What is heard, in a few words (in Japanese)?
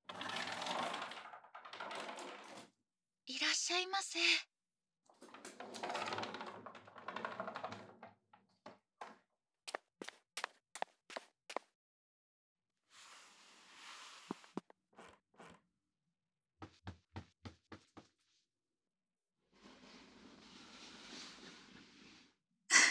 お化粧(顔のお化粧); 駄菓子屋さんとお化粧